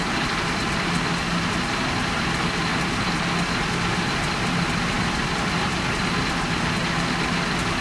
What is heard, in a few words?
panzer6 engine rpm, engines tanks; War Thunder